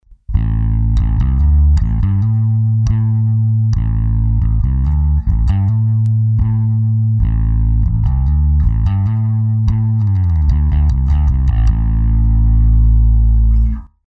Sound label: hi band, verzerrer; ak-workshops